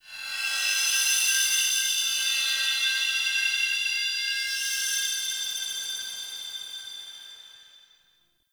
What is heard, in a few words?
Glassy Texture; Ambience; Warframe; Shadowgrapher